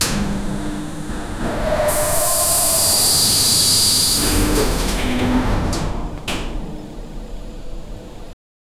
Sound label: gas releasing from a vent; elevator